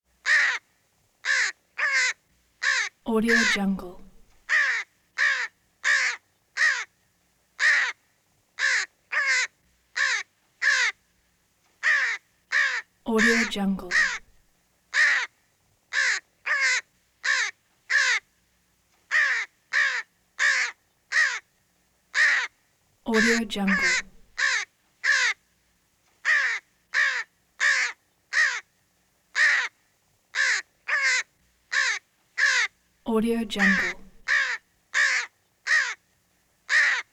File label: crow sharp caw rapid constant; ryder; prosoundfx